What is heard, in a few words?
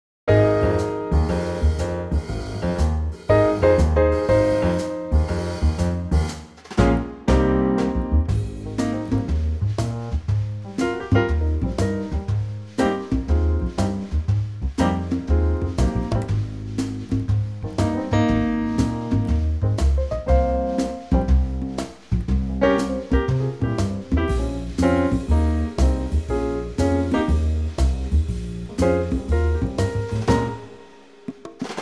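Trio congas Ab, Backing Tracks for Male Vocals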